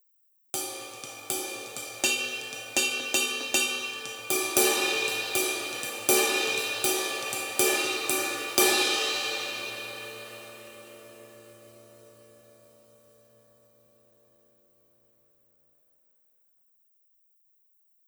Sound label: 19in sizzle ride, acoustic drum; UFIP 19" Thin Vintage Ride with Sizzle Chain; professionally recorded acoustic drums